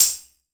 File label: CLUB TMB, CLUB KIT; Wizoo - Platinum 24 Electronic